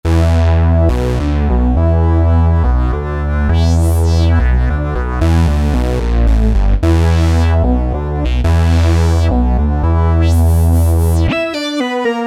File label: 8VCO Mono, Roland SRX-07 Ultimate Keys